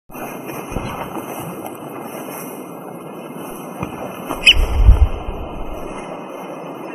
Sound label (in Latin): Fluvicola nengeta